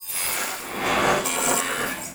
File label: Atmosphere Sci-Fi; MatiasMacSD; SCI-FI ZONE CONSTRUCTION KIT